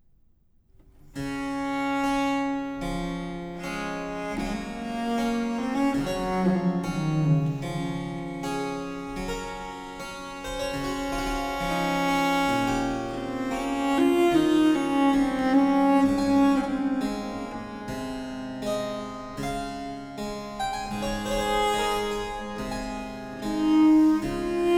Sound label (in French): Sonate pour viole de gambe en ré majeur - Adagio; Bach; BWV 1028